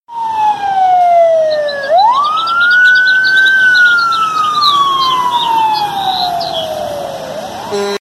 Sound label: Sirens Birds